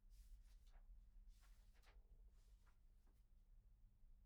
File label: pageturn; IEEE AASP